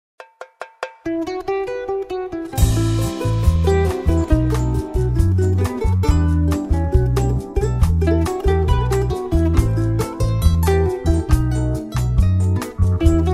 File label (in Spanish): Bossa andina